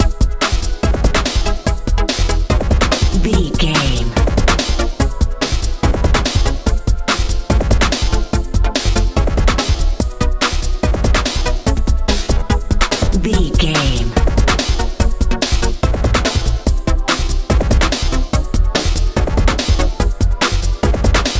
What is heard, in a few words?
k pop